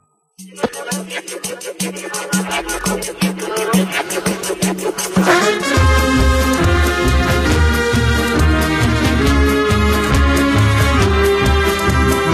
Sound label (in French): Musique